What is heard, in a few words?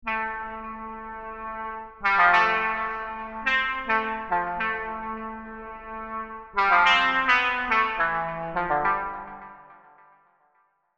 serum synths; chiqui audio